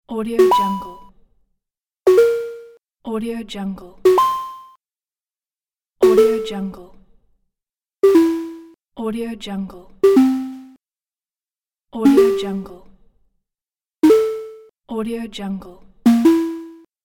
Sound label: new message notification pack